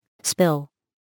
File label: spill